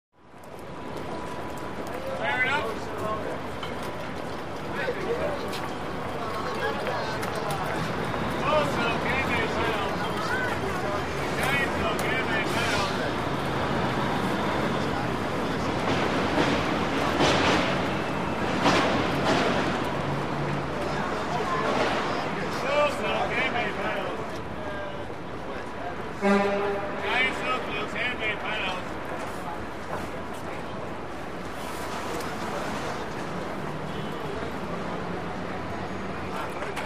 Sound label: City Traffic Midday; big city